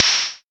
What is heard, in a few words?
urban open high hat sound f key, open high-hat tuned to the F note